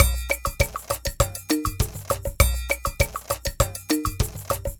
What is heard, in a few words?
Ensemble, Latin Percussion